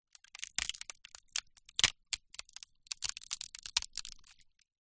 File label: foley basket wicker crush snap stress light